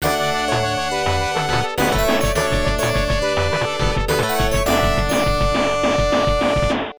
All Rounds Clear; final fight arcade

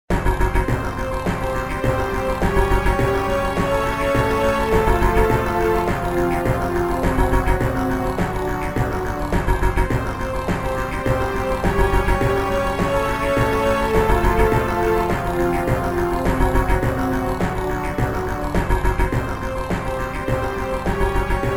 Enemy Missle Launch; Enemy Missle Launch 2 (Fichina #2); Star Fox Command

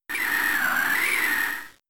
Blizzard

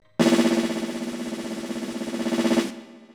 Drum Roll; Mario Party 2

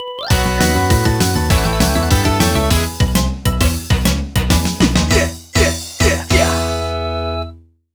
Big Rock Finish; Rhythm Heaven; NDS

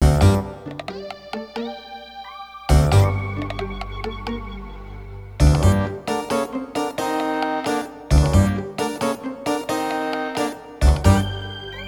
Stereo, Stinger; To the Nines

